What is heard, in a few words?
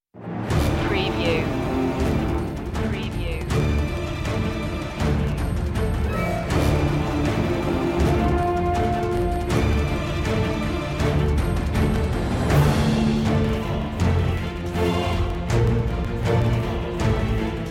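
full mix less choir less percussion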